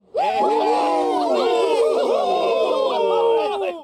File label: cheer